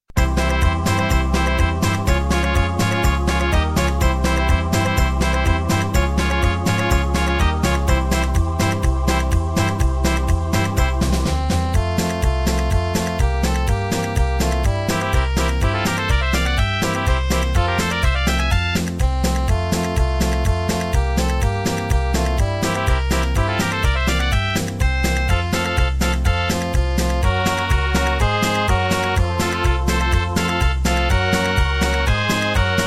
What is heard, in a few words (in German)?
zirkuslieder playback